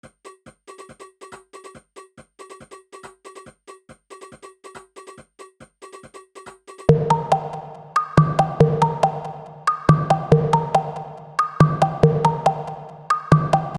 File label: bleep bloopy